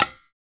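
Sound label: PER PROCU, Procussion, percussion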